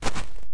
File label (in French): pas hom terre; WehikulCzasu; Time Machine; Bruitages